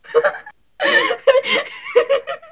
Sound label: LAUGH